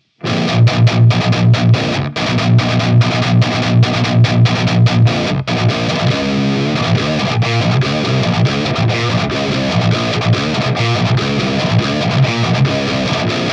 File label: djent bogner